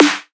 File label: Hard Snare; Antidote Advent